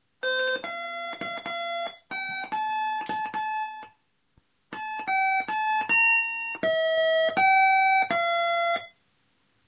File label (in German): klingel